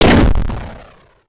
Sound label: impact; desert run